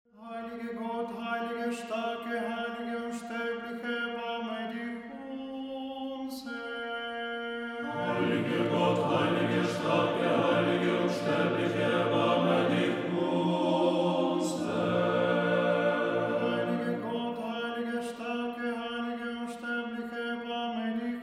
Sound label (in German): Trisagion Vaterunser; Sechste Stunde